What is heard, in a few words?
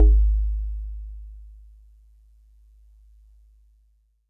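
Celeste DX100 G#1; Pristine Celeste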